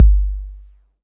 huge kick drum sound g key

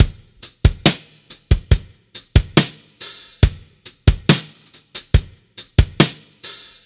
70 bpm drum loop e key

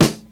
damped acoustic snare sample E key; Duration 0:01

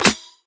crisp snare drum sample c sharp key

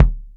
good kick single hit c sharp key, kick drum

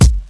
dry bass drum g key

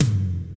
2000s Verby Tom Drum One Shot F# Key

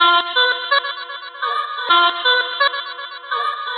chopped vocals ping pong house delayed

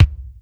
sharp kick drum sound d key

